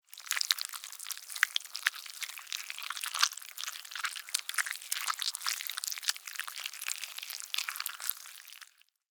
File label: Monster Eat Flesh, Horror, Monster, Eat, Flesh, Guts; Monsters; BlastWave